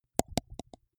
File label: teeth clench multiple; koerpergeraeusche; menschen; soundpool